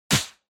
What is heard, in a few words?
Hit Punch Dry; 打撃